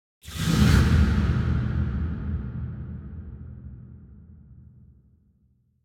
Impact Distant Deep Reverberant Breath; Hollywood Edge; sound designer's toolkit v2

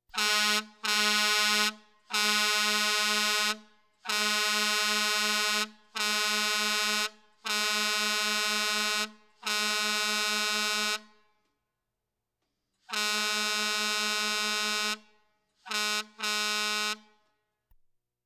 Police, Fire engines

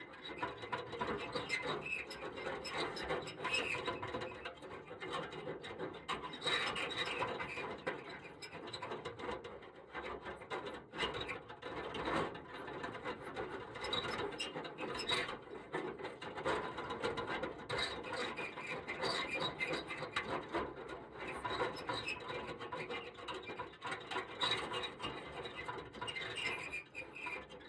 car suspension loop; Blind Drive

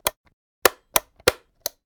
switches; devices accessories utilities; NS Studios - ultra sound collection

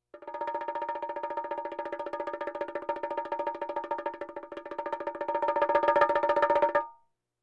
Percussion Bongos Tympani rolls Gongs Drums

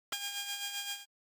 Tone Beep Small Machine; Hollywood Edge; sound designer's toolkit v2

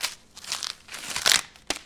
Tear Corn Husk; SFX Kit; Foley - Creaks

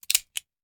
Hand Gun Foley; Sound Ideas; Guns

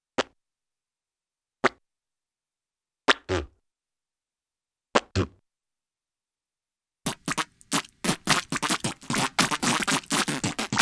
Funny Spits For Tobacco Or Watermelon Seed; Cartoon; Hollywood Edge; Cartoon Trax

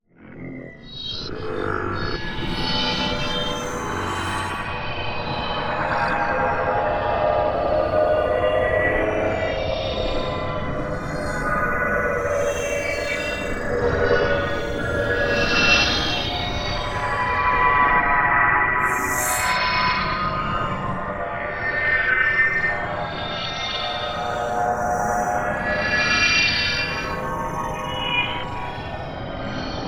MOVEMENT Sci Fi Ghosts; NEON; boom library

